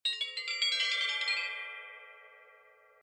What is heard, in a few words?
tone